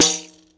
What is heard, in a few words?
TAMBOURI.HIT; Best Service; World Colours